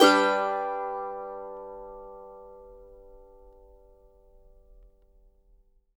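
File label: MANDO MAJ G major up, Right; Sampleheads; Whole Lotta Country 1 & 2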